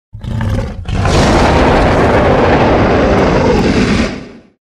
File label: dragon roar; made with Voicemod